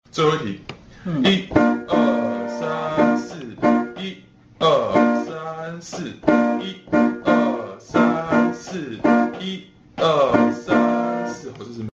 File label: rhythm